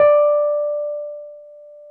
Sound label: Wurlitz EP D4; Sonic Reality - Vintage Timetraveler